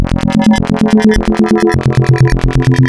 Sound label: Spectral, wavetables; Architecture 32